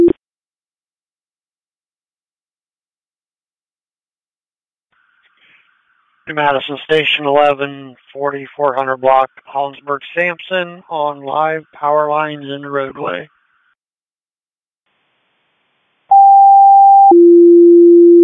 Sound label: Station Fire, Tone Alerts; Darke New Madison Station 11; Saint Marys